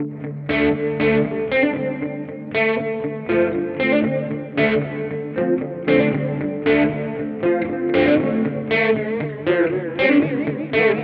death by audio rooms wave

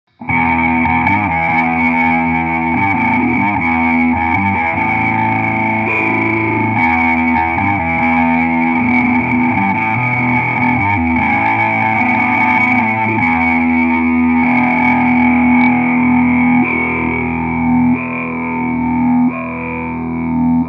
Electro-Harmonix Stereo Talking Machine

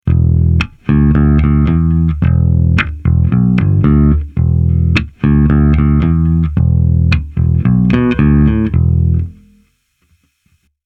JD-150 P-Bass

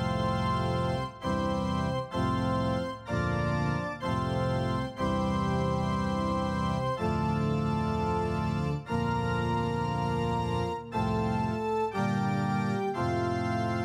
church

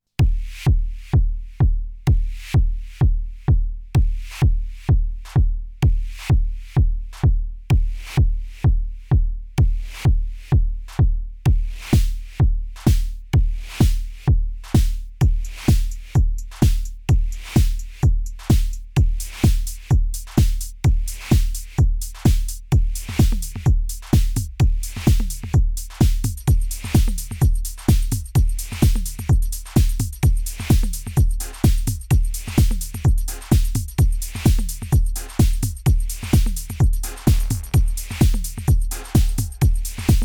Korg drumlogue Sidechain

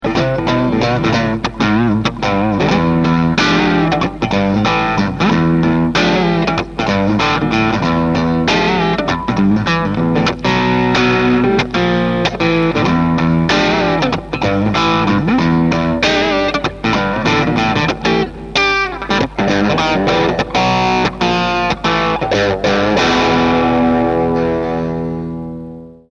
ibanez pos1

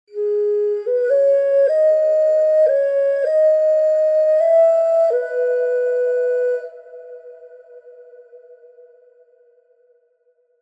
Xun Sustain Legato Reverb; Phoenix Orchestra; Valhalla

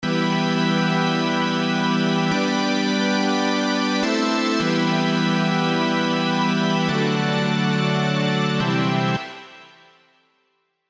Synth Large Bright Hall

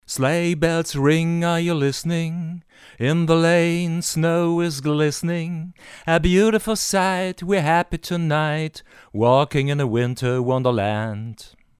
AKG C3000 Vocals

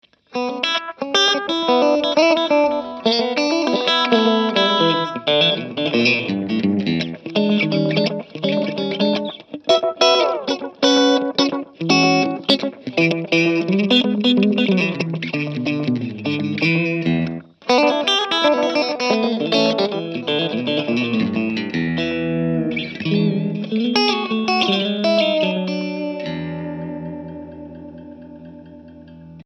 slapback

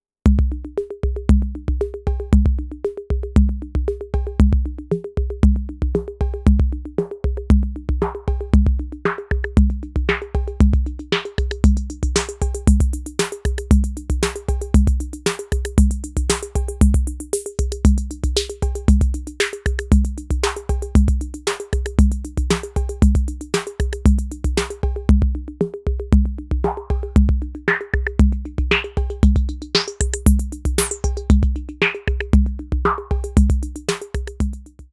filter

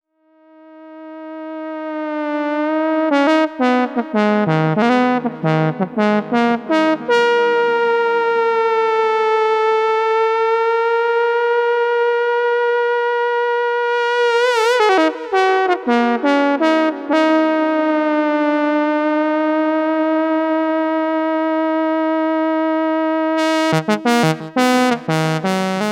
sx400 trombone

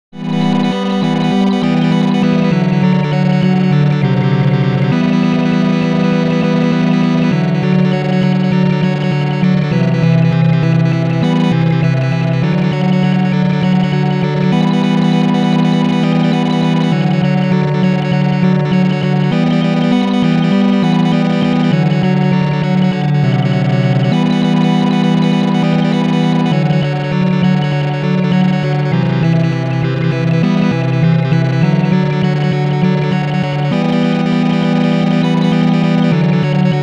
Dreadbox Polyend Medusa Chord Seq

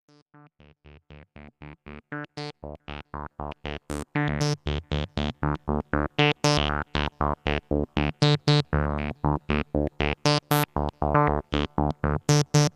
ps3100 sequ

